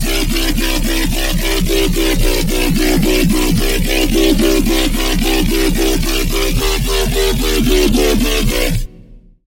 Wobble, Serum 2; Wobble - Down Core; Routed Sound; Serum 2 Expansion - Chaos Bringer Vol.1

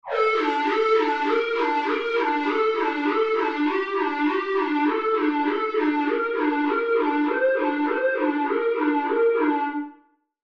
lead, Sylenth1; Routed Sound; Sylenth1 Expansion - Street Vanquisher Vol.1

